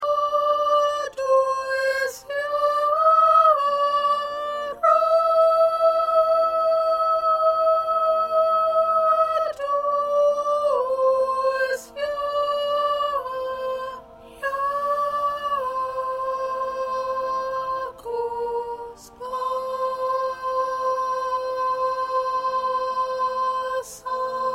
Soprano 2; sacred classical pieces; part preview; practice tracks

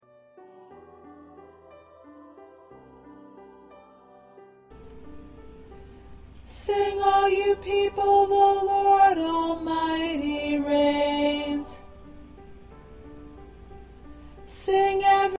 Alto, choir

